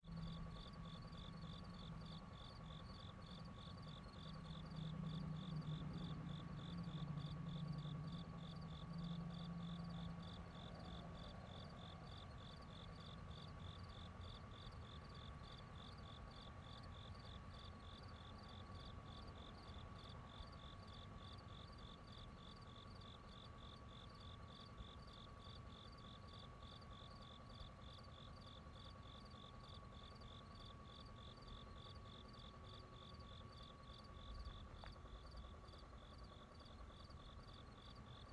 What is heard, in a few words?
outdoor sounds with whirr